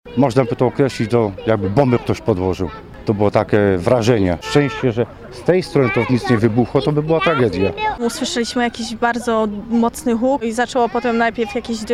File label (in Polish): wybuch